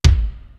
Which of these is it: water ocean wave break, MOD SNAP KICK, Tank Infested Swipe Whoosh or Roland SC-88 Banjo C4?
MOD SNAP KICK